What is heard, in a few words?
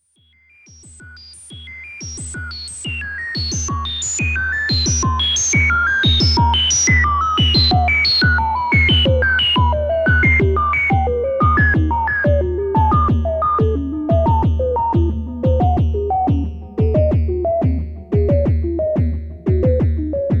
Vermona Mono Lancet Kick Lancet